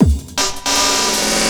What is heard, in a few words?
LOOP, 160 BPM, Drum & Bass